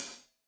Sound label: Hat Loose, Hi-Hat, Cymbals Stereo